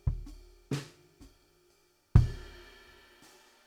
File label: BD Out